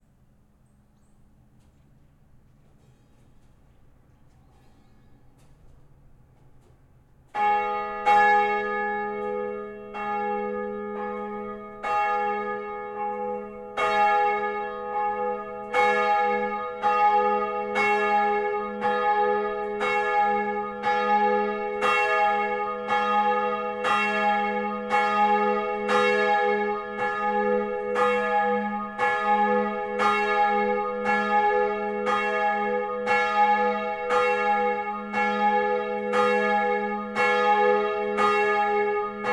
Church Bells Ringing Village; Urban Ambiences; Justsoundeffects

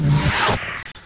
physcannon pickup; weapons

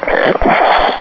bullsquid snort; hl2

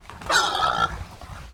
Pig Squeal and Snort, Farm Animals; ANMLFarm; Mechanical Wave

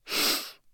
Human, Adult, Female, Sniff, Nose; UberDuo; Character Playset

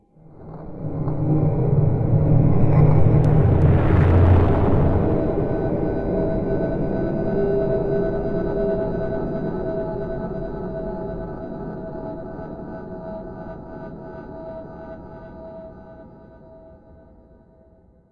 Magic Ambience Spells Menacing; David Dumais Audio; Spells Magic 1

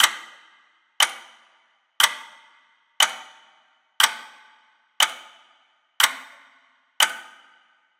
Clock, Effective Trailer Clocks; Federico Soler Fernández

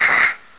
pain, player; hl2

